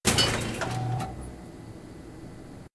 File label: Gear; Puddle